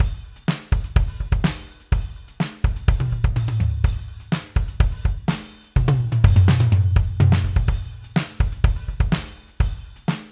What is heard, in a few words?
Drums Loose Vintage; Steven Slate Drums 5